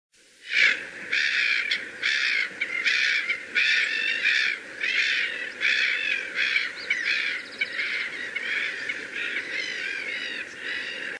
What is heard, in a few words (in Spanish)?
voz gaviota capucho café